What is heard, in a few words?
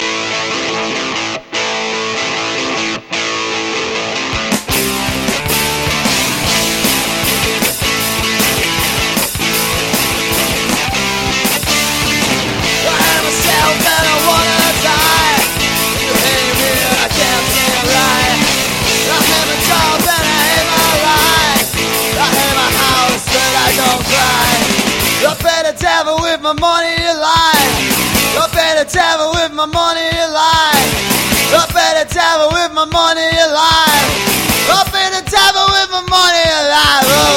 old rock and roll